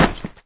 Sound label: flesh impact hard, physics; Source Engine; video game